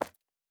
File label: Footstep Concrete Running